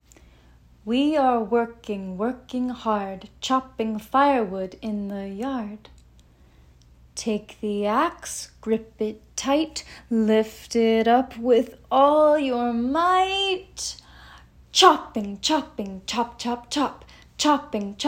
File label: Wood Chopping Chant